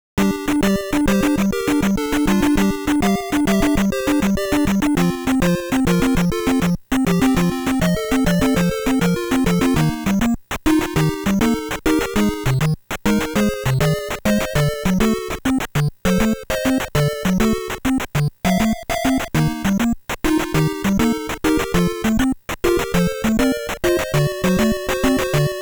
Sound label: Battle BGM